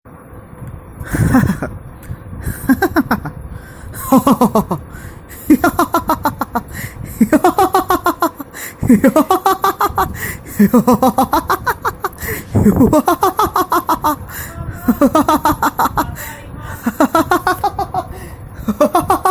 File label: laughing; 1 mins laughing challenge